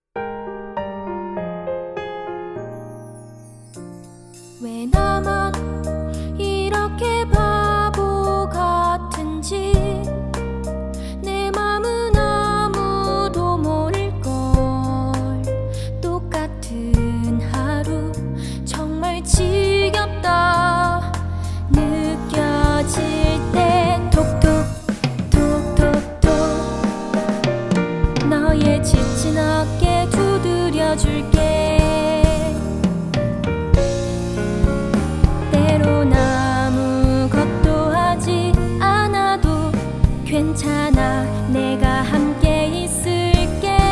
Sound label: Soprano